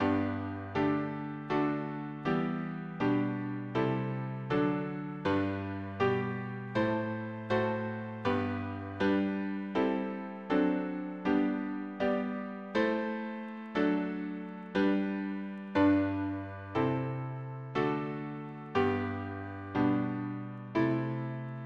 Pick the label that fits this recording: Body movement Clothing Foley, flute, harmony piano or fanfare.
harmony piano